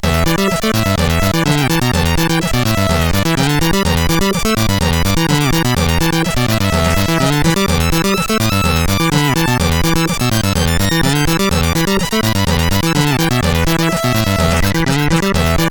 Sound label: Slapbass, CSG8580R5; hvsc